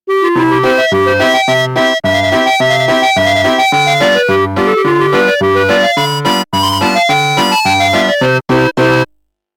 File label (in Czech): melodie